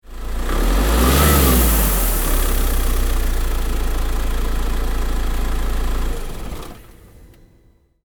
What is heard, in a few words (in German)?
Dieselmotor gasgeben